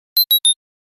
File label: nfc failure, phone